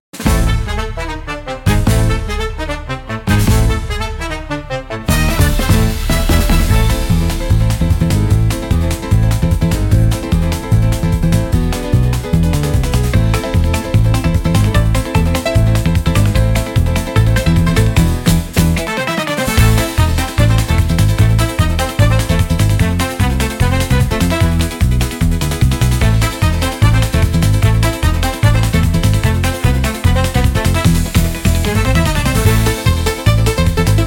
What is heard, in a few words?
Carnival Stampede, upbeat carnival; for festivals and parades